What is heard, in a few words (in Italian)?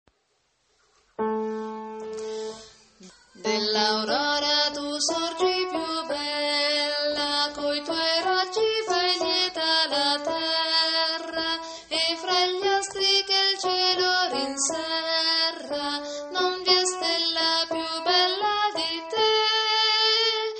soprani; dell aurora tu sorgi piu bella